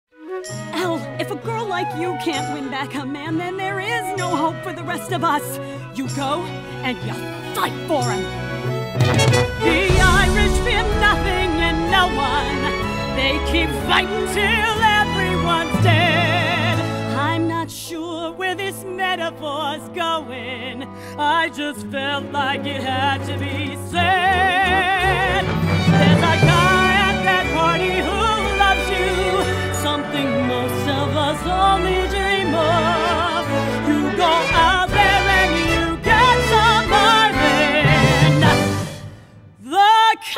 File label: Ireland Reprise Practice Track vocals; theatre auditions